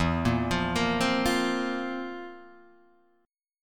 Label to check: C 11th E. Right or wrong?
Right